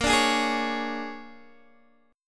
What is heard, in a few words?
A sharp 7th Sharp 9th, Guitar Open D chord; x,13,12,14,11,11; down